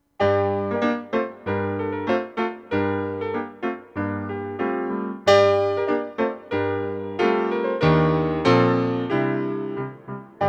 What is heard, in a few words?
SATB flutes